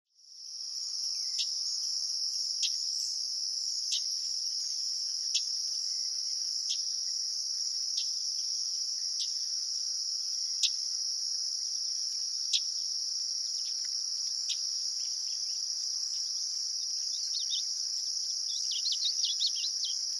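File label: Common Yellowthroat August babble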